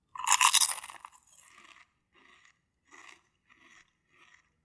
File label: chip crunch chewing closed; soundscrate